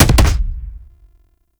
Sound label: Rocket Launcher Punch; Warframe; Weapons; Tenno; Lasrian